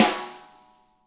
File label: DW RIM; DW KIT2